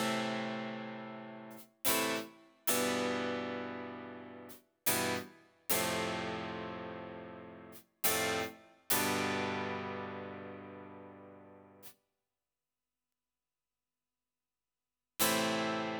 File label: Harpsi